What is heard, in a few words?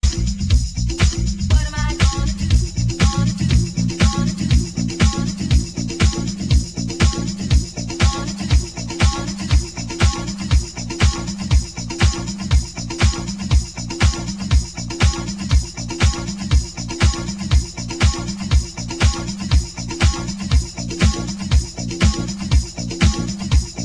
kick